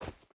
footstep hide dirt run; Rust

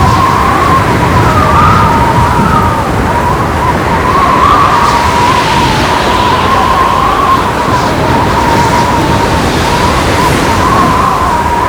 wind airplane decompressed; Call of Duty Modern Warfare

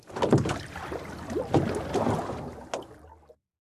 ambient port; World Of Warships; port3d

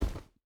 footstep rubber boots dirt jump land; Rust

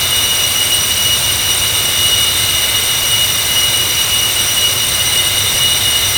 Aircrafts Engines Engine TF34 GE100 Turbine; DCS

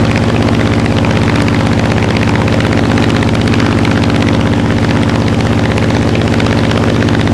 hellcat rear, engines; War Thunder

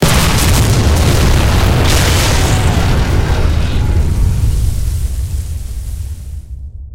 explosion naval

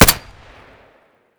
glock17 close; Escape from Tarkov

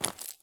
walk slate; Escape from Tarkov

